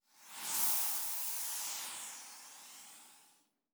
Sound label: Spray Hiss